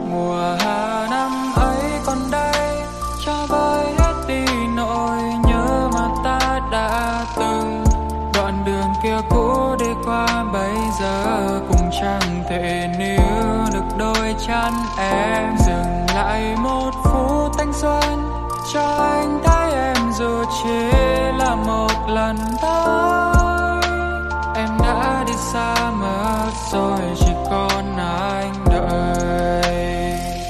Lofi

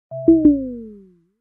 critical low battery sound; oppo coloros 12

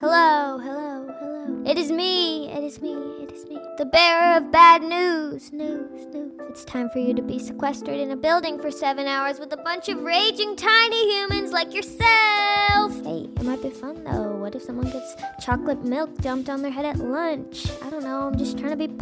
cute alarm tone

